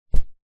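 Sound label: household pillow bamboo drop carpet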